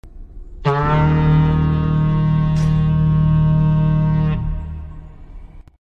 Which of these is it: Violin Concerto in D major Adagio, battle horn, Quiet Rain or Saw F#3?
battle horn